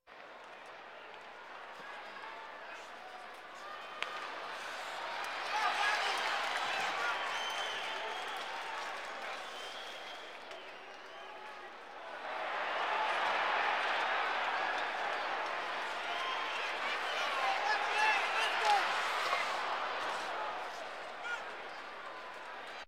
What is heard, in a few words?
Sports crowds